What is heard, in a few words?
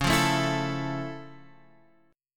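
F Augmented Major 7th D flat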